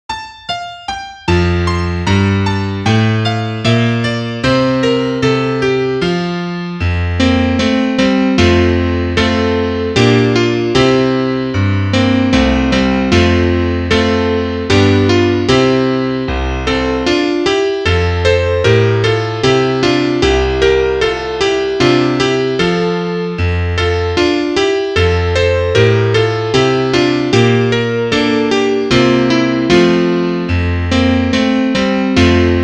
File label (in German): Klavier Solo, trompete; Kein schöner Land